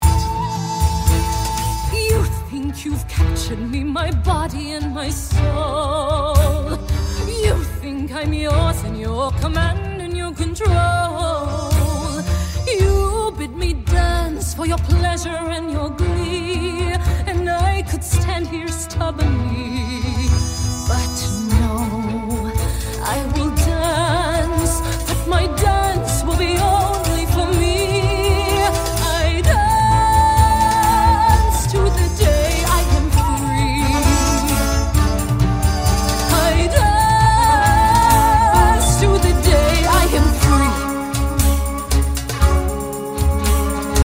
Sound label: Adult Female Cast Recording